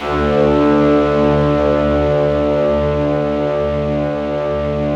BIG ORCHESTR, Yamaha VP-1; Music Store; 90's Sample CDs

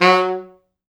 F#2, Pop Brass

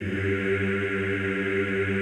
EEH G1, Classical Choir; Best Service; Extended Classical Choir

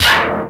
crazzy perc set, Casio FZ-1, a#2; Retro Sampler

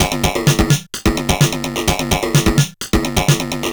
Bent TR505 (128BPM), Circuit Bent

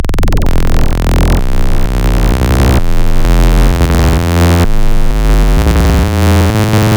Phase Shift Rcp, wavetables; Architecture 32

